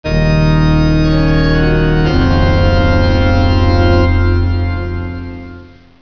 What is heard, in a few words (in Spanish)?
organo